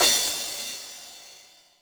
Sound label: CYMBAL, Yamaha RM 50; Drum Samples; Cymbals